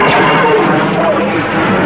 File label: blaster